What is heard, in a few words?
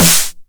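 909 SD Tape, snare drum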